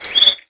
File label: Birdy; DS; NEWSMB